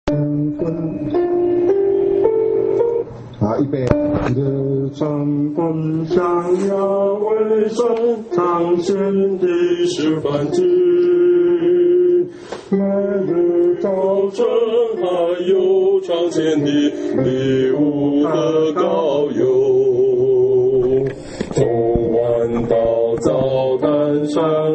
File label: hymn; worship